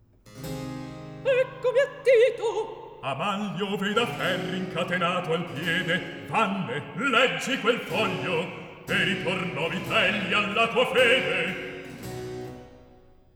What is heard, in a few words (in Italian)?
Recitativo; Atto 2 - Scena 16; Lucio, Tito, Vitellia; Eccomi a Tito